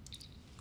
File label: commbadge; Trek